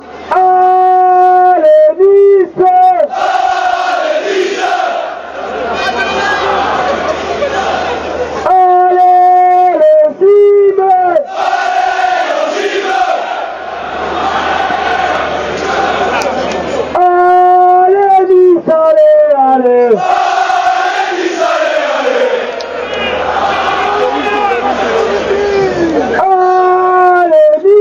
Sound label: gagnera, chants; nissa